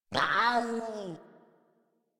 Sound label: Pet Meow Misc; Warframe; Catbrow; Pet Ship